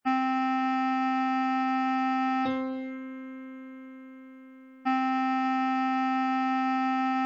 c major c

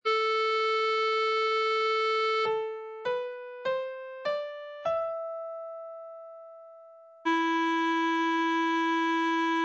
a minor e

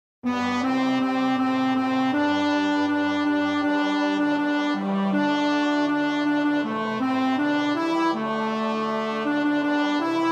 now is the month of maying, tenor; morley

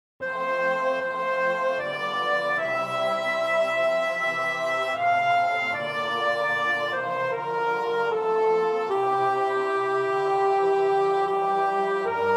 the silver swan soprano; gibbons

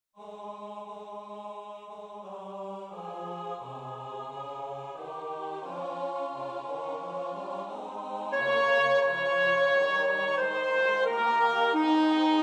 almighty and everlasting god soprano; gibbons

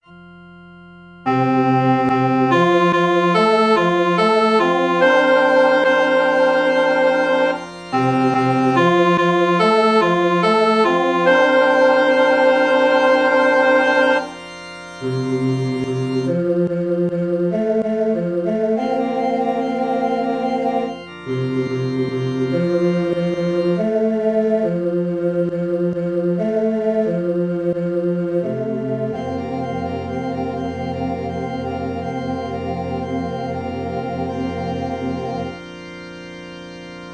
soprano; britten; rejoice

